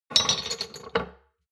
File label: shot glasses pull out hard, gastronomie; berufe; soundpool